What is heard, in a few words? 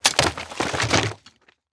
Crash Debris Thrown Books; Crashes